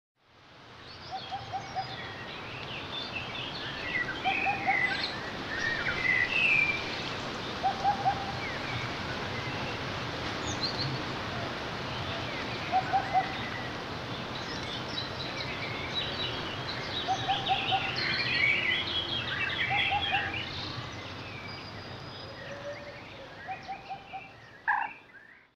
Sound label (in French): HUPPE Fasciée Upupa epops Premier Chant